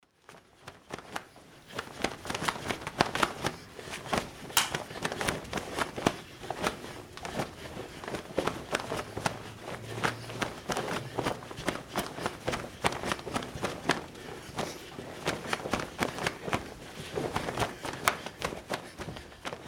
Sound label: karate sparring